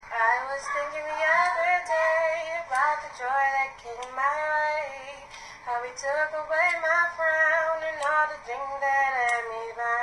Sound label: Robin Singing